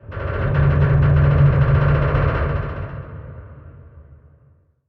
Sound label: submarines engines; World Of Warships